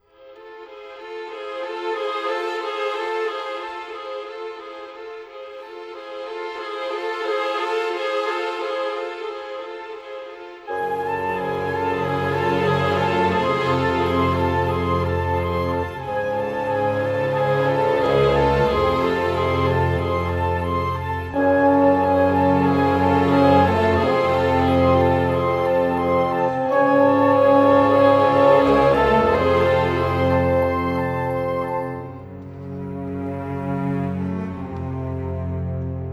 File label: Cinematic, Orchestral Series; Sonic Foundry (Sony Creative Software); Orchestral Series VOL-3; Cinematic 09